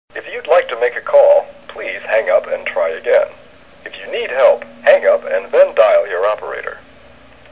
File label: offhook